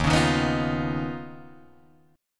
Eb 7th Suspended 2nd Sharp 5th Eb, Guitar Drop D; 1,4,1,4,4,1

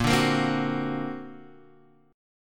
D Augmented 7th Bb, guitar

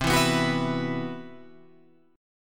C-Major Sharp 11th, Guitar Open F; CM#11 strummed; JGuitar

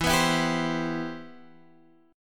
E Minor Add Flat 13th; maddb13:1,b3,(5),b13; Emaddb13 Chord {X 7 9 9 8 8} strummed

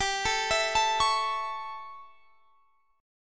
A 7th G, chord

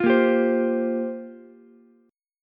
G Minor 11th G down Ukulele C6; Gm11 strummed; JGuitar

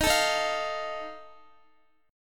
D sharp Suspended 2nd Flat 5th, Guitar; D#sus2b5; strummed; x,x,13,14,15,12

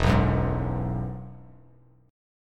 E Major Add 9th, E, Bass Standard; strummed; JGuitar

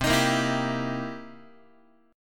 C 7th Sharp 9th C Guitar; strummed; JGuitar

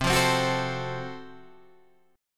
Gb Minor Major 7th Flat 5th C

